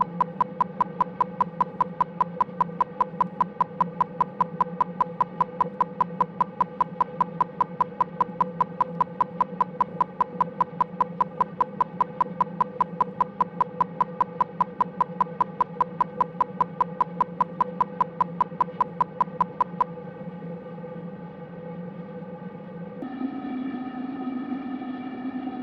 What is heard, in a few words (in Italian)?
burst 16ms; Da Sorg2 a Mic3; CESI; LaSpezia